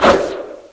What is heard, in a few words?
toss